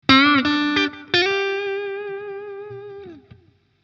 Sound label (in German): Blues Licks